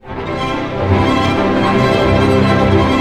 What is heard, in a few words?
ARP C, STR ARP MAJ; Sound On Sound - Peter Siedleczek's Orchestra